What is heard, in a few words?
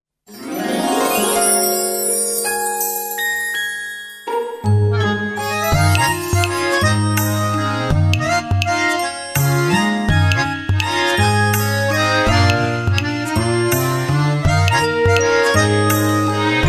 humming hill